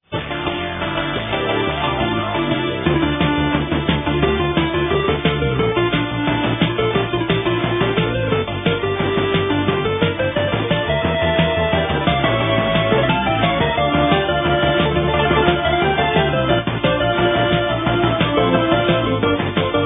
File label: game music